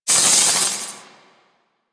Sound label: Sword Elemental Electricity Whoosh; Warframe; Teshin; Weapons